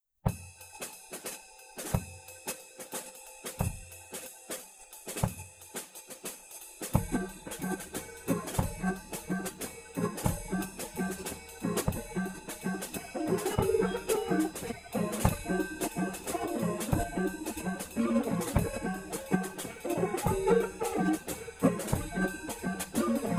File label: percussive, Phase based harmonic percussive separation